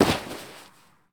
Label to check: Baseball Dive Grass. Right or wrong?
Right